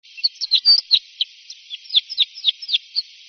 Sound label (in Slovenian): krivokljun